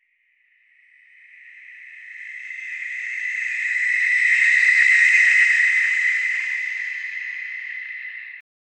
drone stabilizing whirr

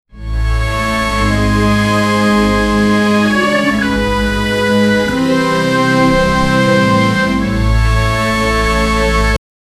royal music, royalty

